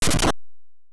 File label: player use item knife; 8 eyes